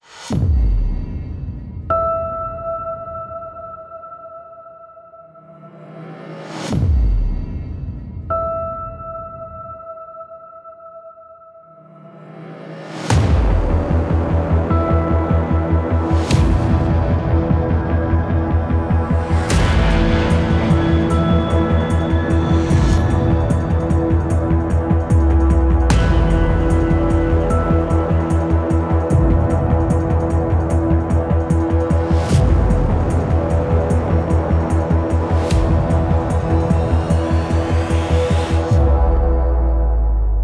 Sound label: Agressive Trailer